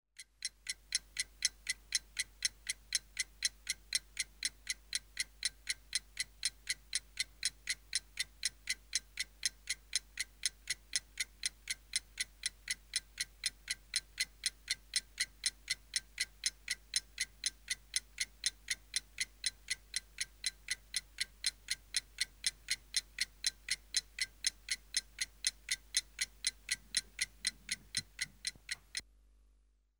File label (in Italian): OROLOGIO TICCHETTIO